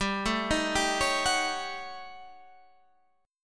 Eb 7th Sharp 9th Flat 5th, G

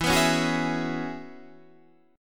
E 6th Add 9th E down Guitar DADGAD

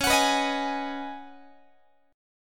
C sharp 9th Suspended 4th C sharp Guitar; strummed; chord

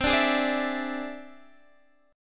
E Augmented 7th C

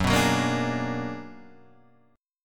B-Minor Major 13th

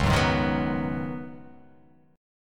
Db 9th Suspended 4th, Db9sus4 chord; strummed; JGuitar

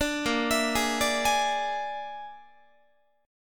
A sharp 7th Flat 5th, Guitar chord; A#7b5; arpeggiated

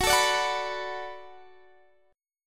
D 6th Fsharp, chord

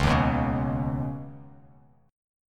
C sharp 7th Suspended 2nd, C sharp, down; strummed; chord

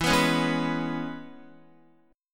E 6th, down, Guitar; strummed

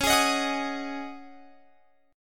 Db Suspended 2nd Suspended 4th Db Guitar Open F; strummed

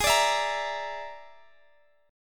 Bb 7th Flat 5th Ab

